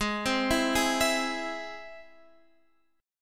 G sharp Augmented G sharp, down, Guitar, Half Step Down